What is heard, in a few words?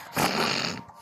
snort